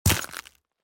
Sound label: Footsteps on broken glass shoes single step; impacts; gfxsounds